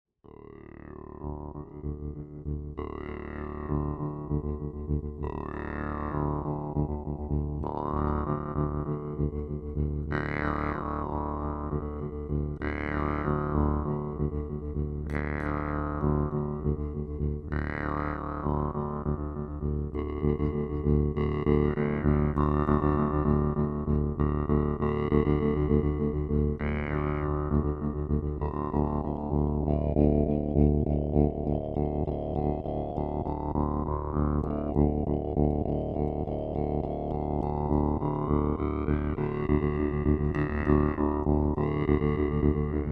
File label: Blackfire with delay, jewsharp